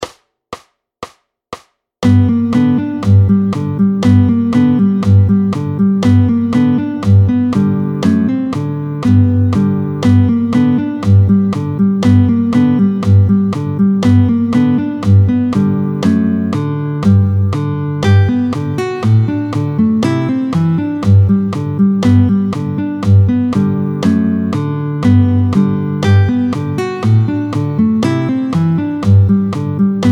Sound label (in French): Jolie bouteille Vite 120, picking